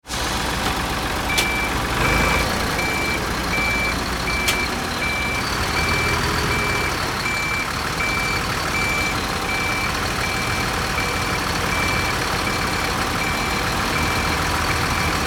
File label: Bulldozer movement backup beeper